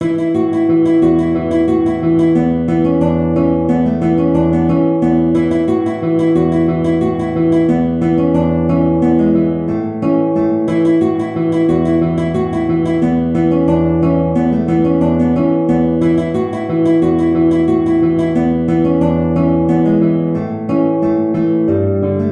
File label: guitar